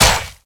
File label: Step Default; Infested; Zealoid Prelate